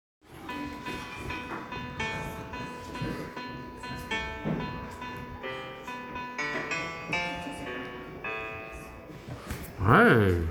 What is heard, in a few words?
piano